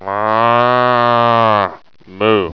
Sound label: moo